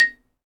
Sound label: XYLOPHONE, Percussion; ILIO